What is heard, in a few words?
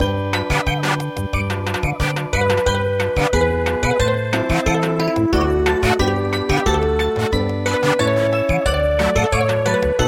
Samba